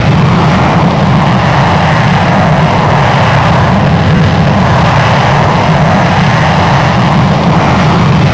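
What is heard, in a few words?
Rolls-Royce Spey whine; vampire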